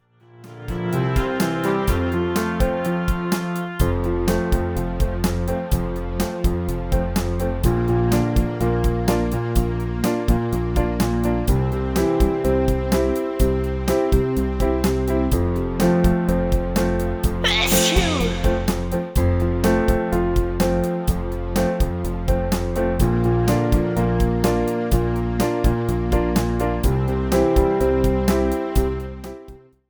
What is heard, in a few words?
BACKGROUND MUSIC